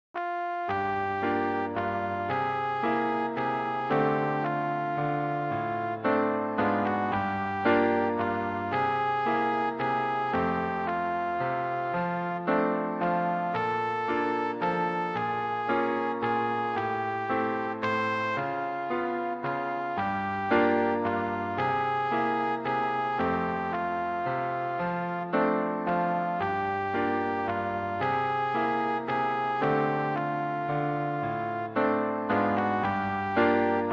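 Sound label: swing trumpet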